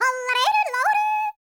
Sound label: YODEL, World Voices